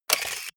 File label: camera